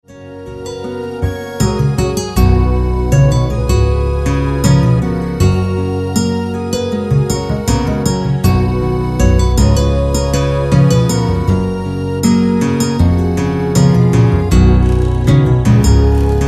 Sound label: hawaiian guitar